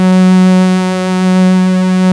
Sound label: PAD Geige; Best Service Gigapack 1